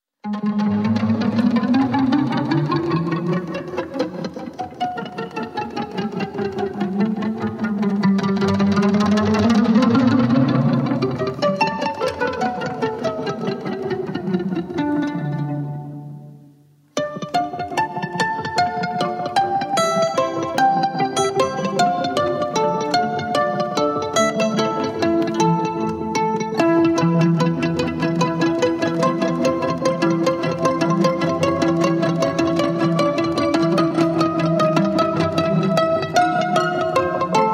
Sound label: Waltz